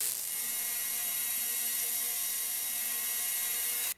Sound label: sharp high pitched electrical buzz; electricity